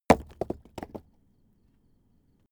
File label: stone small impact; natur-tiere; steine